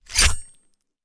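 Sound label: Knife Bayonnet; Sound Ideas; Guns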